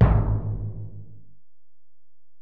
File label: Bell, Alesis DM-PRO